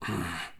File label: breath heavy in; Apotheorasis